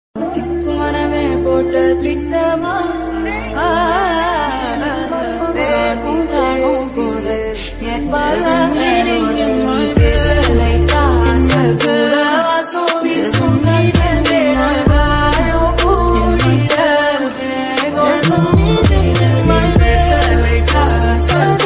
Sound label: song remix ringtone